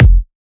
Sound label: Kick, Psytrance; One Shots